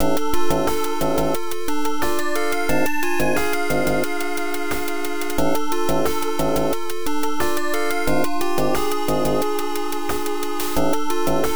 School - Suspense; Adventure Tsukuru II; PC-98

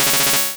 Aurora Beam

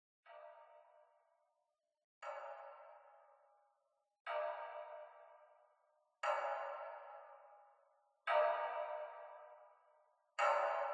Clock Interior

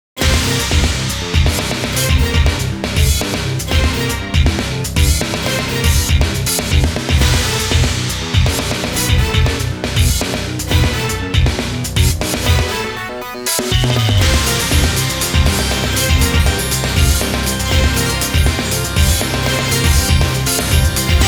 BOSS BGM; otomedius x; Falling Rock

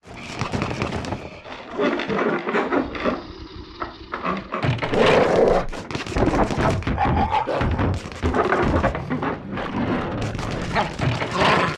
Feral Scratching Door; silent hill homecoming 2008 gamerip pc ps3 x360

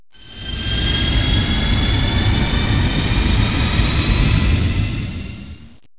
liftoff dropship

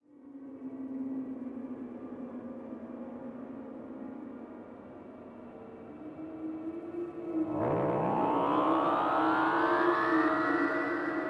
space ambience; Worms 3D

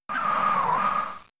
Razor Wind; Pokemon; Gen 3; attack moves; RSE

